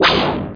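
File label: spider gun; Shadows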